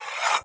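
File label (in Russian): затяжной чёткий звонкий звук заточки оружия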